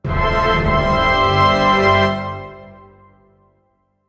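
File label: sounds with an effect of completion, ending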